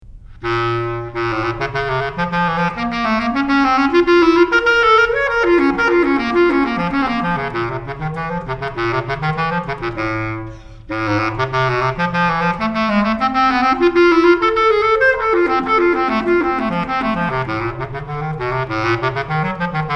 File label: Bass Clarinet High School